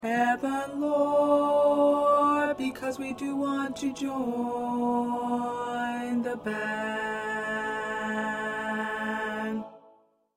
Tenor 2, choir parts; TTBB

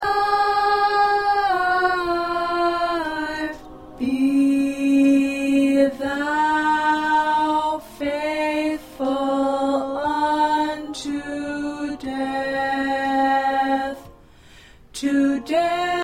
Alto, choir

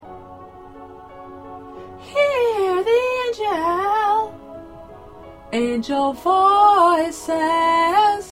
Background Alto; christmas songs for choir; part preview

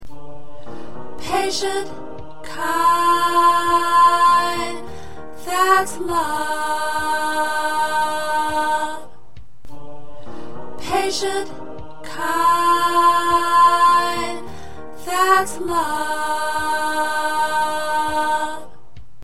1st Alto, choir

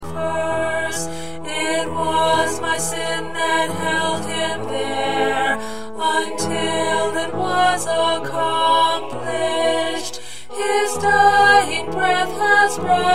Alto, choir parts

